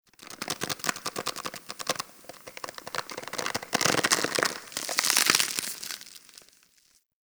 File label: Tendon Stretch Large Close; Ambience; Warframe; Infested Eris